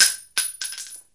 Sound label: small tink concrete; weapons